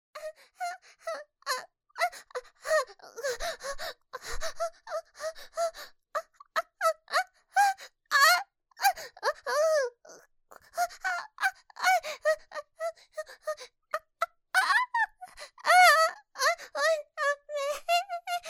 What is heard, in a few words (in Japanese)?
喘ぎ 中 強